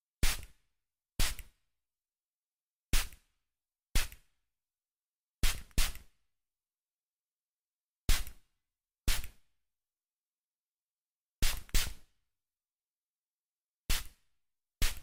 Perfume Spray

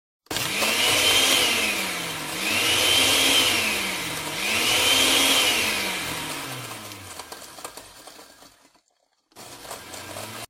blender sfx

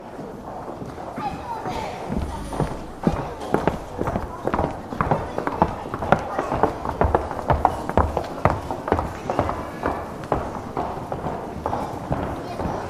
Crowd walking sound; human

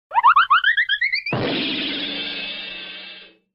Slip and Fall; human

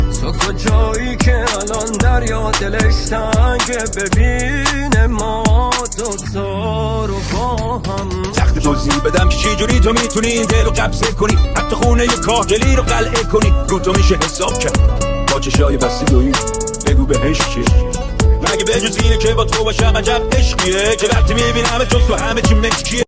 Remix

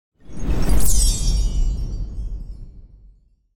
Whoosh Magical

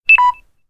low battery alert, phone

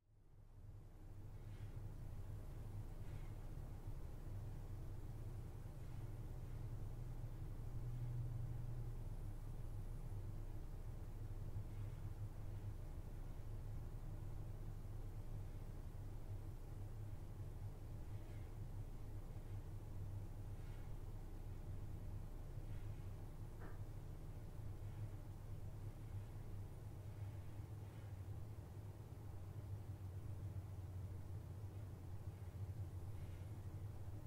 room tone; house ambience

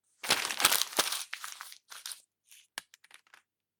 CRUMPLE PAPER

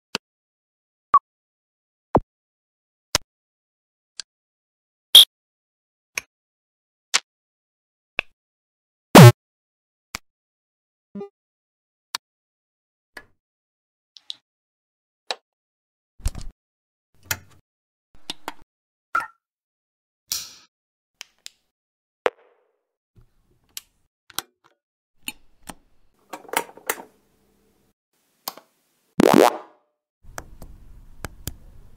multi button sound effects

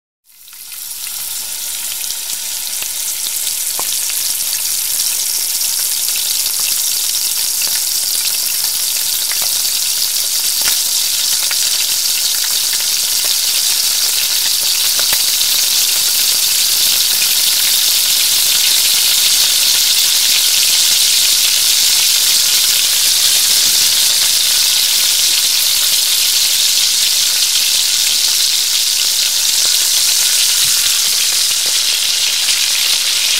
Frying Food Sound

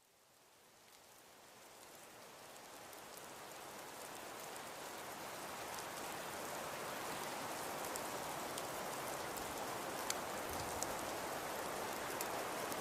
snowfall

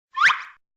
Cartoon Slip; human

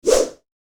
movement swipe whoosh

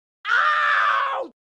Pain Yell

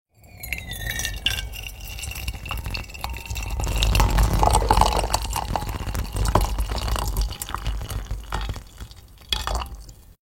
Pouring Sound Pouring hot tea from kettle; kitchen

